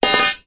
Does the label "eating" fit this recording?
No